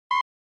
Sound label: Retro Anime System